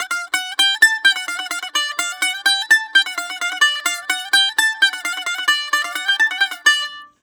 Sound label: BAGLAM D, Mediteranean